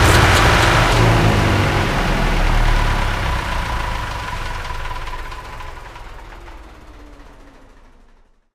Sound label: crane stop; source engine; portal2